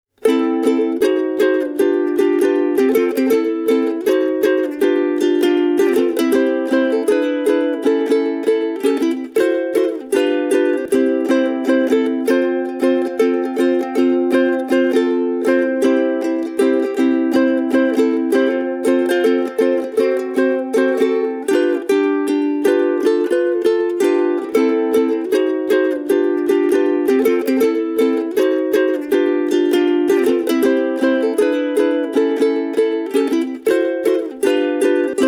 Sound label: Ohana SK 70WG solo